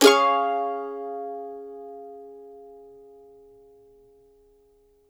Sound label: CEE CHORDS C#, Blues Guitar